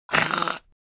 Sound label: Alien creature pain small